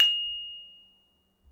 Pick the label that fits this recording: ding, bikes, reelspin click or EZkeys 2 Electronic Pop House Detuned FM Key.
ding